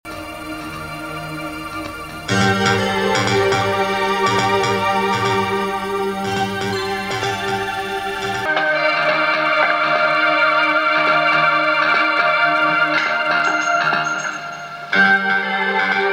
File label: dramatic theme